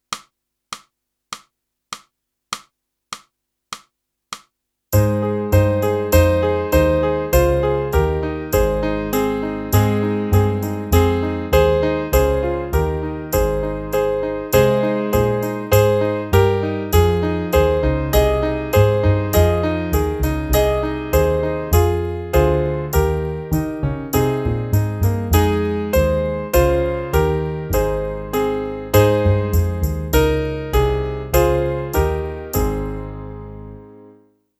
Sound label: sax